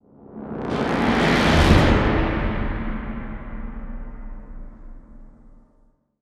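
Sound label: sting whoosh drums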